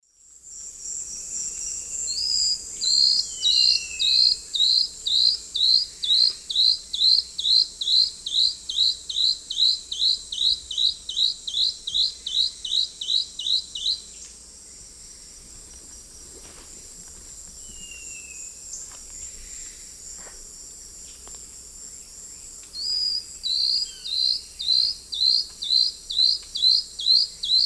Myrmotherula hauxwelli, song, S. America Eastern Lowlands